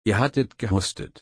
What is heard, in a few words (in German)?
husten